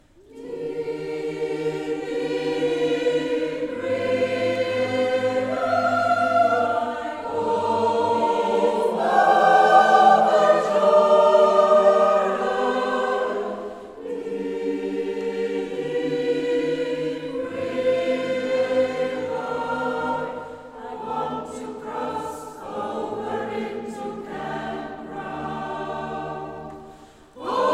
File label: Deep river, Gospelchor